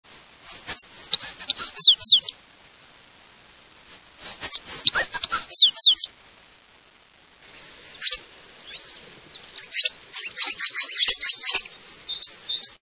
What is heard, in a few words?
American Tree Sparrow